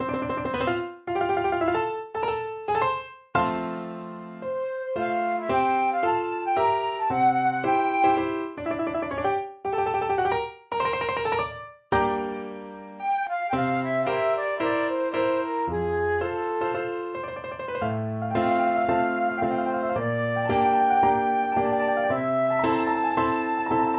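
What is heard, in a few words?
Brazil National Anthem, flute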